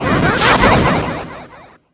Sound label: Fire Spirit Transform Skull